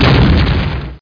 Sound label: Door Slmm